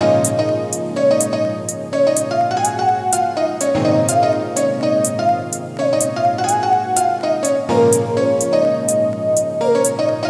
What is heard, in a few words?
melody loop